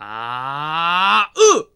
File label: "ahhh uh", shout; AKAI S6000 CD-ROM - Volume 6; Human